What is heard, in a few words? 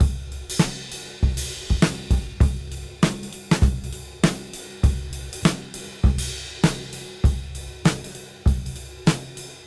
Cym Beat 99 BPM